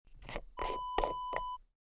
cosmetic jar open

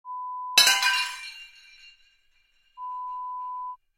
Plate smash

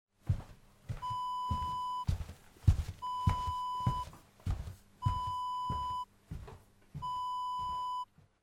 footsteps on rug

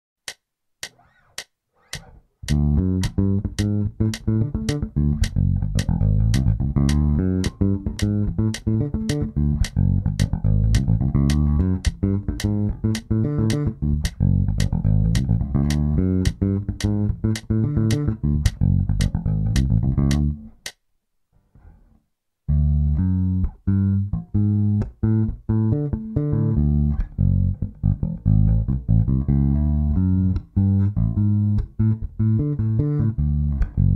Finger funk D7

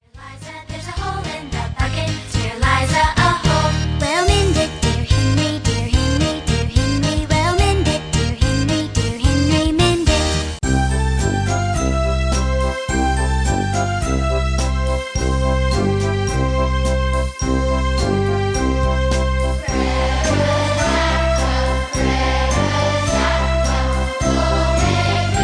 CHILD

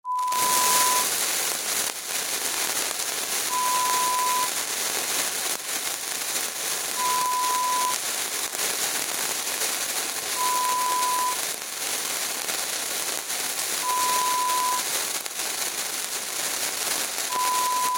Sparkler sound

